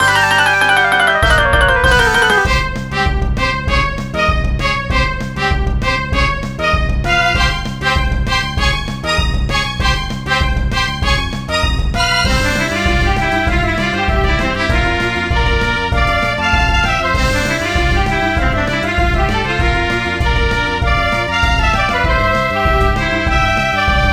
Card Duel (Instrumental); Friday Night Funkin' Pokemon Card Battle

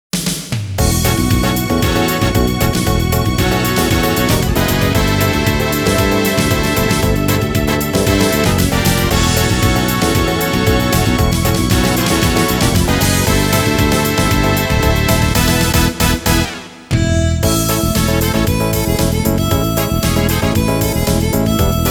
Success Summer BGM

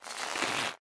Sound Spider Web; Peace, Death!

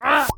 Caramel Out Sound; Jolly Battle

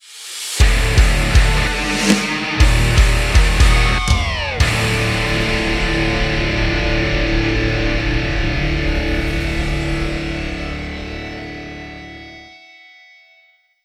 Draw

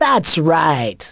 correct; lego my style kindergarden

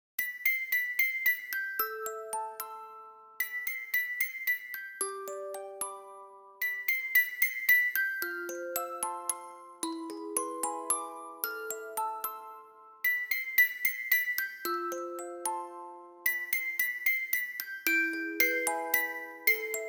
Petal rain (Violin.ver); On-Looker

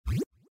Enemy Swing

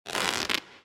Zip; Cookie Jam

